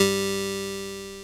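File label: ORG Vox G, Continental organ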